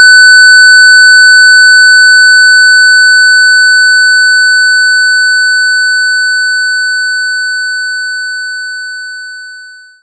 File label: Square 1500Hz